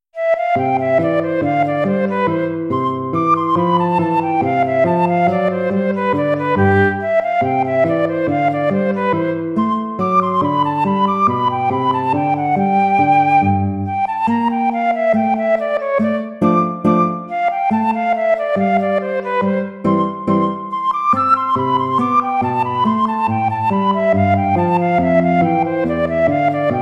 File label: hornpipe; hook james